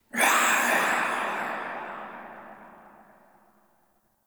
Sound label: Ghost Pain, Vocals; Enemy; Nightfold Test Range Ghosts